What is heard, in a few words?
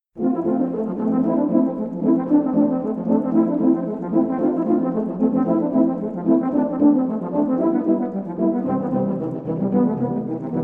Tuba; Widor